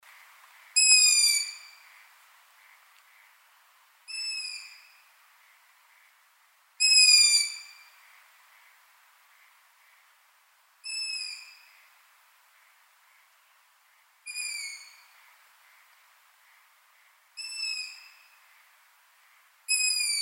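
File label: long-eared owl voice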